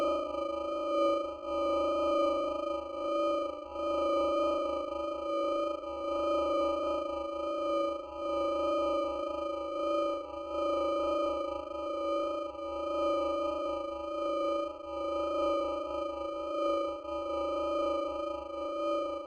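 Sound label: drone hover distant loop; Titanfall 2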